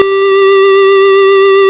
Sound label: Hard PCM Chip; chiptune